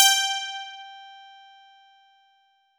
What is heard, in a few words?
G4, PIANO; AKAI S-Series CD-ROM Sound Library VOL-8